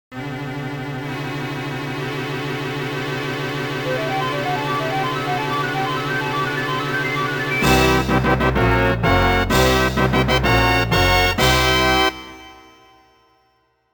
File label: Boss Unlock; Donkey Kong 64